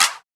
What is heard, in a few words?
Clap; Vengeance Samples